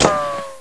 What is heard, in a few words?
Ricocht